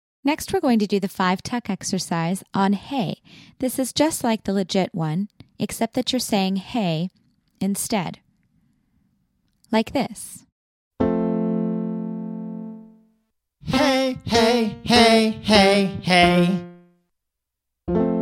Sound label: Belt Five Tuck